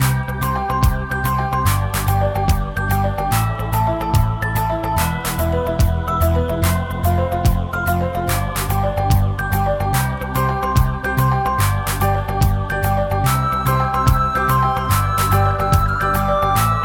Oldtune; lemmings psp 2006

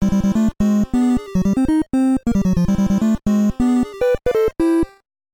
Finish Level; Ice Climber; animal crossing full gamerip

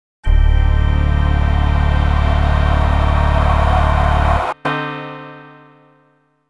mission complete bgm

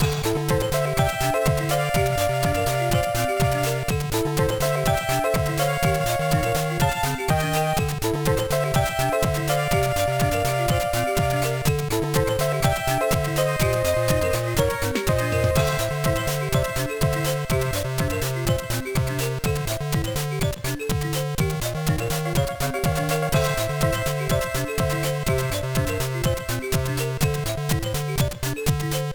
BGM; Ueki no Housoku Jingi Sakuretsu! Nouryokusha Battle; GBA

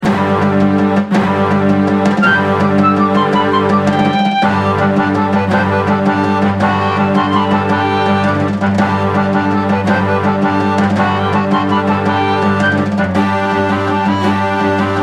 Bgm Fantasy

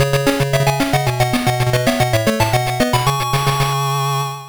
Round Clear; Shinobi II The Silent Fury; Game Gear

